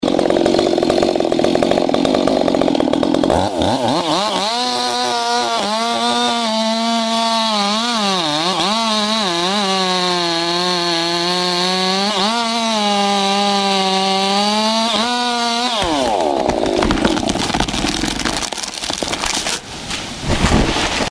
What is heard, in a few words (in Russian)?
benzopila pilit derevo